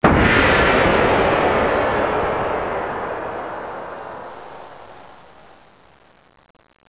MOCK

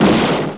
EXPLO

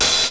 BIG HIHAT

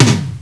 TOM

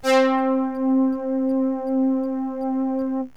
JUPITER, SYNTH; JUPITER6

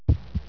BEAT

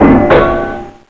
METAL; ENVIRON

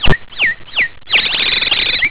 BIRD

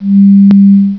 HUMMM

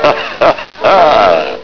HAHAHA

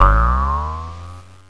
SLOW BOIL, cartoon

